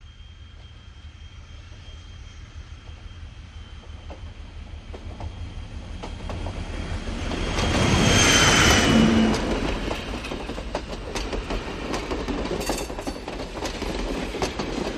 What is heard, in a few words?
Diesel Loco Pass By